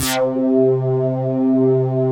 DRIVER SYN; Pro-Rec - Synthsonic

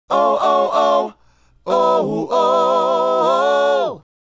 Male Group, Hip-Hop and RnB Vocals

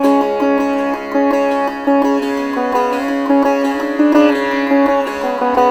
SITAR; Spectrasonics; Heart of Asia

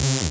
SYN PRESSLI, dance synth; Sound & Vision - Gigapack I CD 2 (Roland)

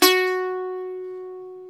SAZ, Plucks; Eth Plucks, Pluck Duo

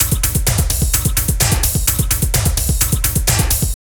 Drum Loops

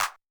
hand clap; Roland TR505

